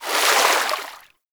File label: Hoverboard Terrain Caster Fluid; Warframe; Vehicles; Venus